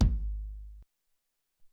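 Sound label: Long Kick 4, Alesis SR-18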